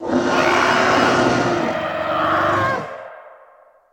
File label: Elephant Monkey Howl